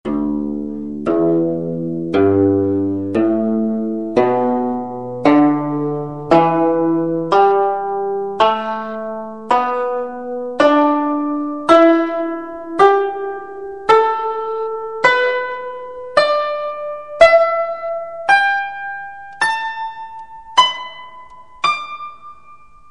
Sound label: zheng scale